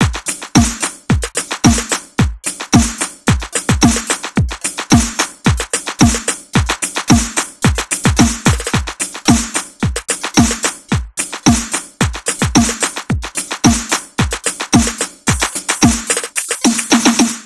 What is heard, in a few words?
loops drum pattern